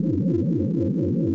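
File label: conduit Force Field loop; Star Wars Obi-Wan; XBOX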